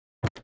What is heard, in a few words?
reel Stop